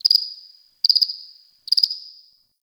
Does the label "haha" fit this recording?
No